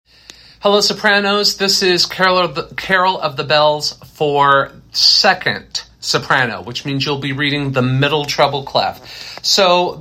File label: 2nd Soprano; Carol of the Bells